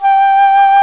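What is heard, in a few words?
alto flute, vibrato; Roland L-CDX-03 Disk 1; A.Flt vib 2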